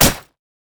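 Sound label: siegerider basic attack; minions; LoL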